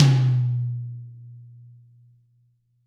Birch Tom Hard; Drums; apulSoft apTrigga2; Steven Slate Drums

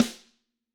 Snare Hard

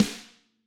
Snare Hard

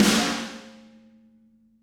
Snare Hard

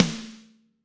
Snare Crack

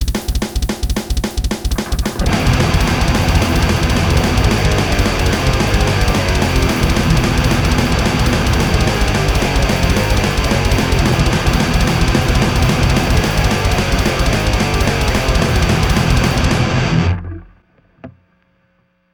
Live Room SM57, guitar; soundcheck

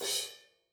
Crash Choke, cymbal; apulSoft apTrigga2; Multi-Velocity Cymbal Samples

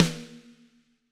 Snare Crack

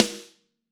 Snare Crack

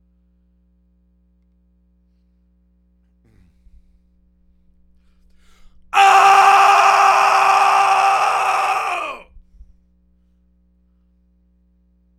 Vocal

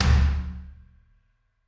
Kick Hard, Drums; apulSoft apTrigga2; Steven Slate Drums

